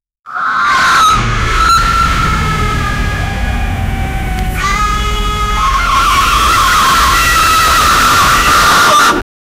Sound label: goblins screaming